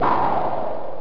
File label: scifi